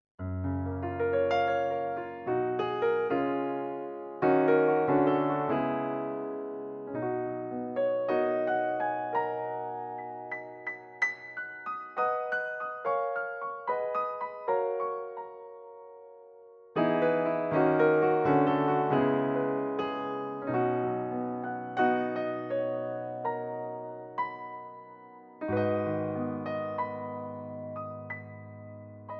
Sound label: Electro Grand